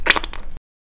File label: Bottle Squirt